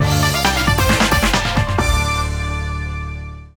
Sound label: sting; Buzz! The Mega Quiz; PS2